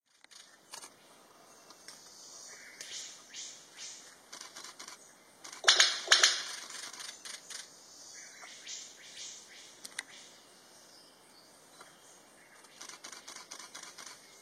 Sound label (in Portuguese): canto do passaro foice de bico claro